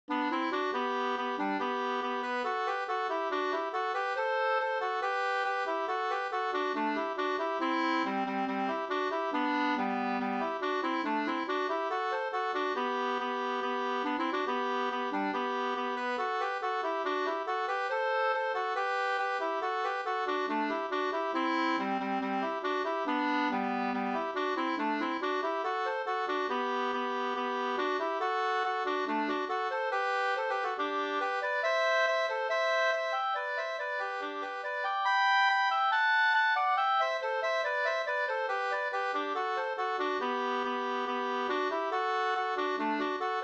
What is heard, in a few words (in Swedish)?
schottis 4-4